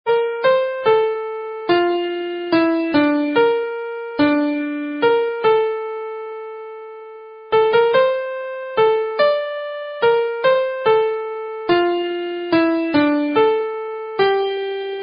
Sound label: Tune For The Whorehouse Bells Were Ringing; Fatal Wedding